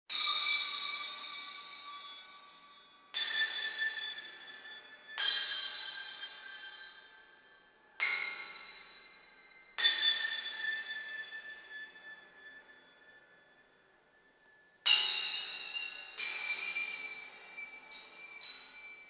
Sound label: waterfall